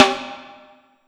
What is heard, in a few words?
Snare Drum; Drums - Snare